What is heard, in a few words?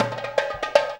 DARBUKKA; Best Service; World Colours; DARBUKKA MLT